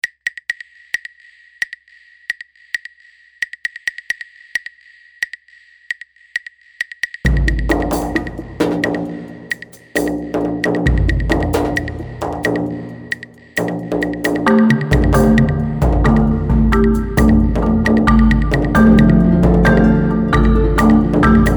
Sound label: ens perc; zoom in